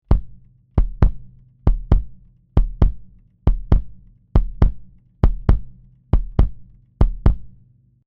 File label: Oktava117 Kick Out, Kick Drum alone